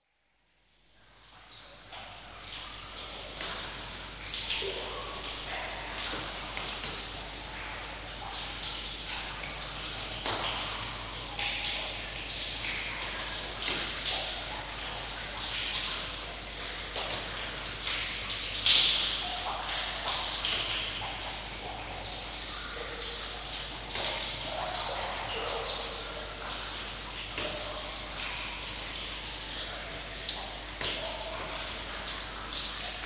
Cave, interior (Recorded at Witches Kitchen, Wookey Hole); Water; Caving; BBC